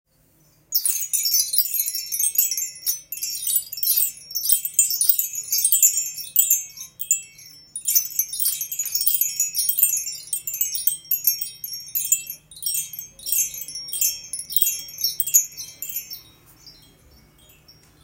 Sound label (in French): son carillon